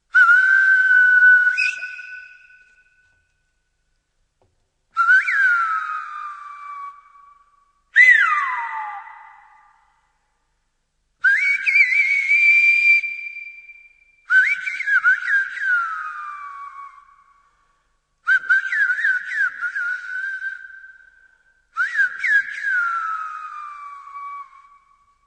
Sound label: Silbo